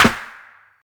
boomy snare sound a sharp key, Loudest frequency: 1963Hz